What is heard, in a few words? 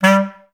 BCS STAC G2; Best Service; Peter Siedlaczek's Advanced Orchestra